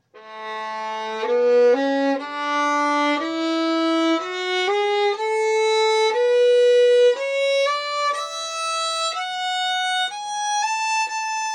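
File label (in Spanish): Escala de la mayor en negra, corcheas ligadas y negra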